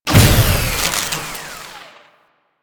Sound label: Energy Ribbon Shutdown; Kahl Quest; Warframe; Quests; Veilbreaker